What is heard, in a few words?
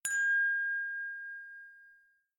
huawei notification chess